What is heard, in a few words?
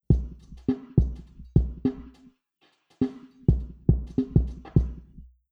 drums impulse 0m palisade; Pommelte